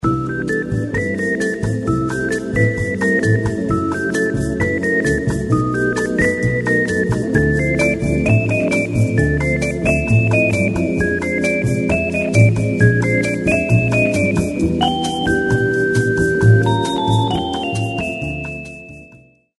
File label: samba; Elevator - On Hold - Muzak